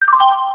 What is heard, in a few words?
snd Msg; NATICQ2; Naticq pack